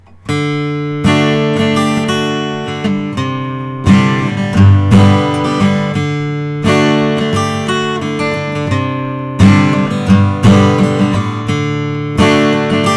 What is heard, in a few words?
collings sj full body sunburst